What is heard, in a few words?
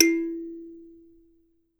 THUMB PI, Kalimbas; E-MU Producer Series Vol. 7 – Old World Instruments